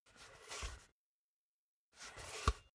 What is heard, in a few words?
basketball spin in hands fast